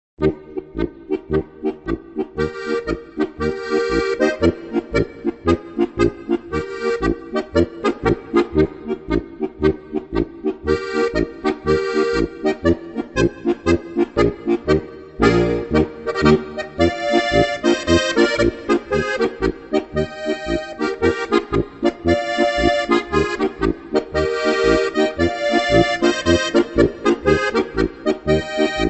Polka

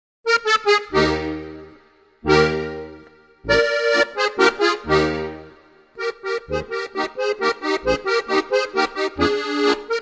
plattler

